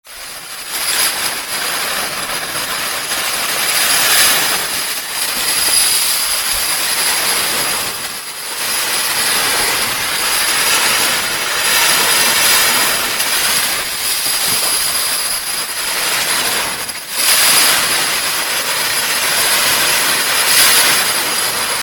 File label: Sea Waves; Grating; Cajo